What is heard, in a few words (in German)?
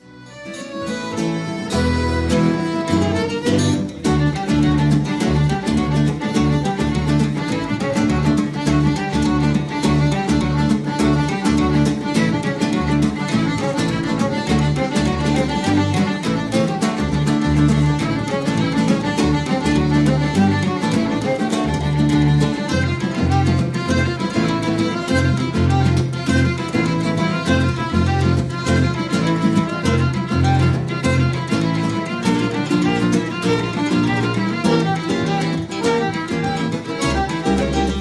harmonium